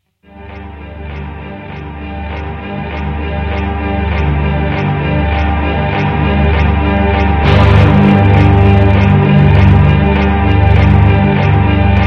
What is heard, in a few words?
Dark Lullaby